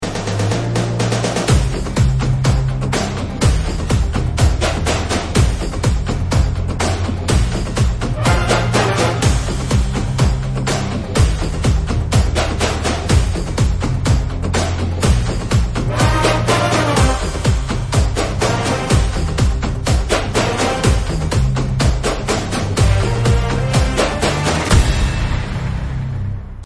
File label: Karaoke Ringtone